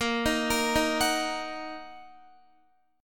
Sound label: Bb Suspended 4th Sharp 5th, Bb, down, Guitar; Bbsus4#5 arpeggiated; JGuitar